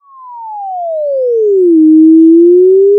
Sound of a police car siren

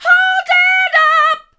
hold it up hard, vocal

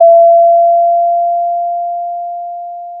pop, Sound of a doorbell

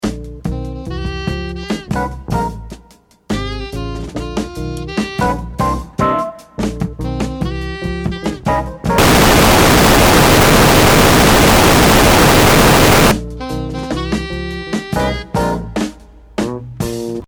noise

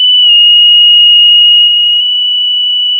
pop, sound of a tea kettle whistling

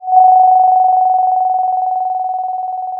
Sound of a phone ringing

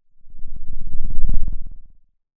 Sound of a lion’s roar

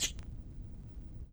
Vinyl Perc

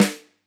close Snr, drum

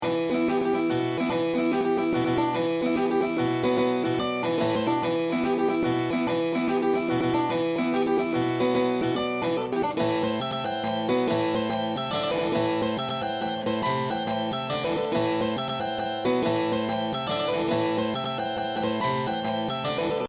loop mono

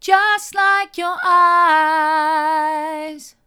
hard, vocal

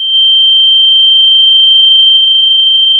Sound of a tea kettle whistling

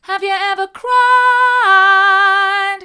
have you ever cried hard, vocal

